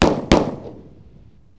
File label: two shot; Wired for Sound Pro CD; Aristosoft, Inc.